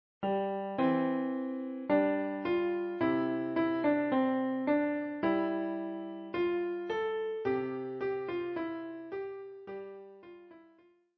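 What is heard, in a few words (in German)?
Ade zur guten Nacht, piano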